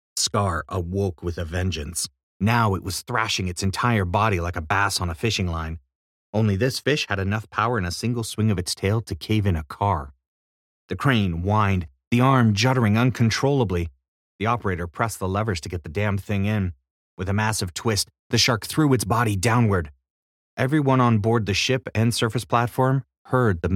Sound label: Thriller tense scary